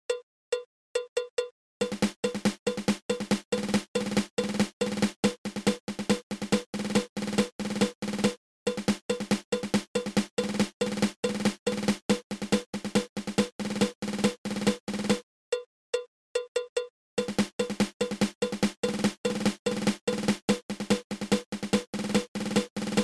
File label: Five Stroke Roll